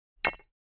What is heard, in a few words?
Pickaxe Striking Hard Rock